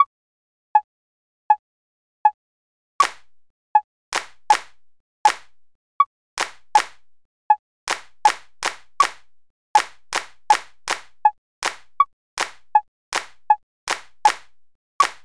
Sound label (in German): leseuebung 80, rhythmik; viertel-achtel-leseueb